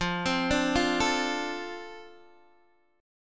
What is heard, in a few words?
F 6th F down Guitar DADGAD; F6 arpeggiated